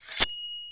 draw, sword; Dystopia; weapons

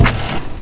debriz, weapons; Dystopia

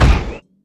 heavy feet, footsteps; player; Dystopia

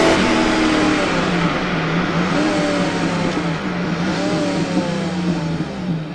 brute slowdown, vehicles; Dystopia

